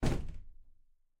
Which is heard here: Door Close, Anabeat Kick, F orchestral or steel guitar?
Door Close